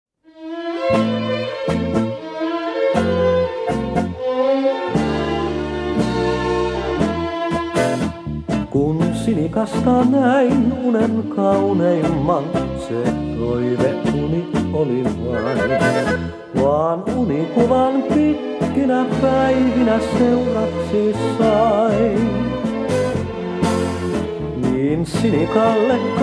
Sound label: Tango